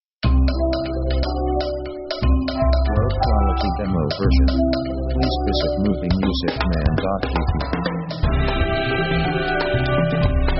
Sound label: orc joyful